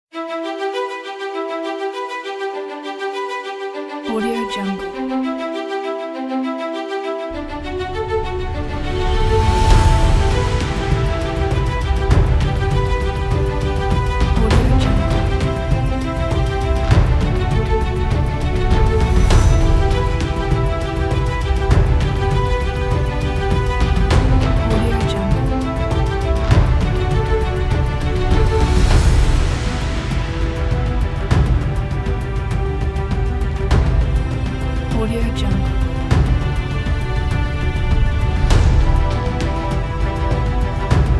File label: epic and triumphant inspiration